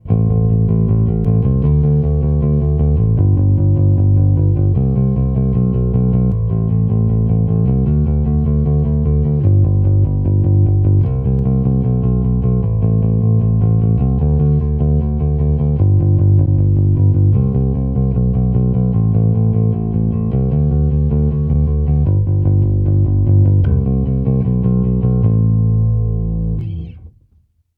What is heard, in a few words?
rock pick comp